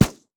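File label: turret basic attack; LoL; heimerdinger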